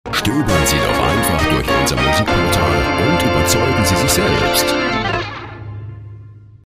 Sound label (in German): comedy tusch